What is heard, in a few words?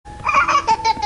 laugh